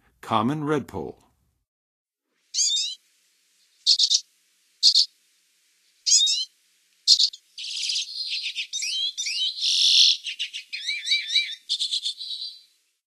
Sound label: Common Redpoll; N. America; Songs of Birds of North America; Western USA Songbirds